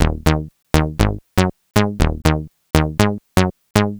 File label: 120 Analogue Bass; Zero-G; Soundclash Nu Dance Electro; Combi Loops; 120bpm